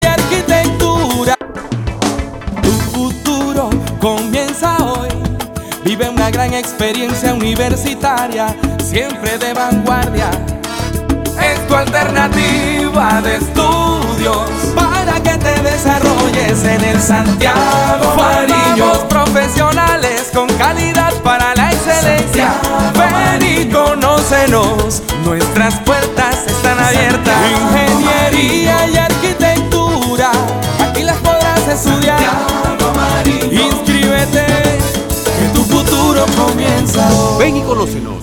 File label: jingle; SANTIAGO MARINIO; LARGO